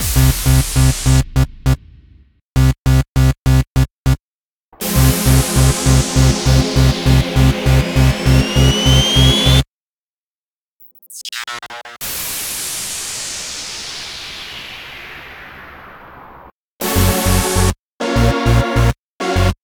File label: bgm